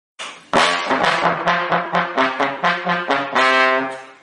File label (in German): Trompet; csgo